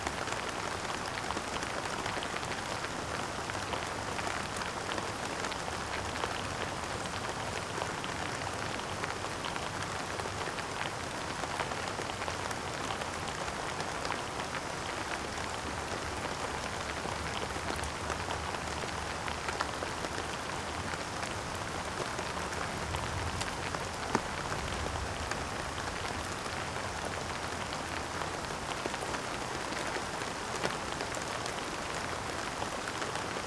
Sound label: Sprinkling Drops